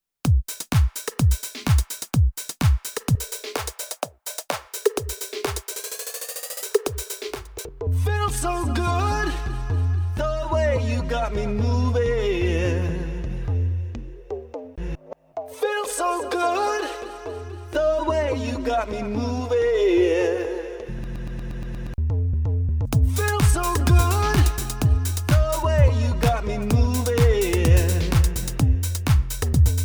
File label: Roland MC-101